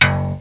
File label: Basso; gnustep